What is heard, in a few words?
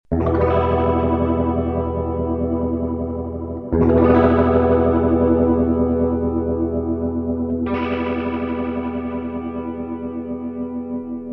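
clean electric guitar cls222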